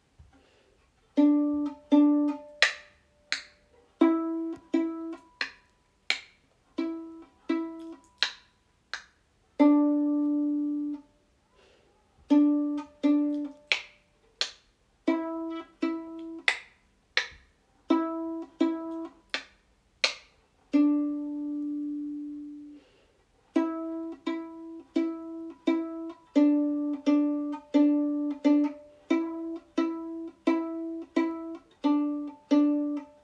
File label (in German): Gespenstertango Bratsche pizzicato